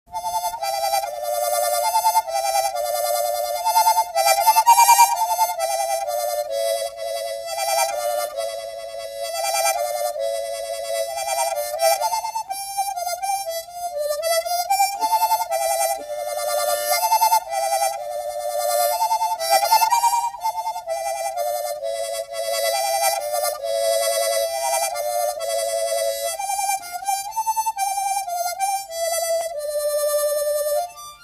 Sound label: The Harmonica and the Waltz